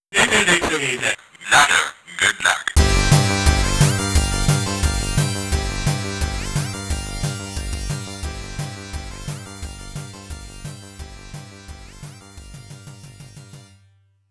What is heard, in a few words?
Game Start With Voice; Technosoft Music Collection Thunder Force I II 2022; Super Version Tan Tan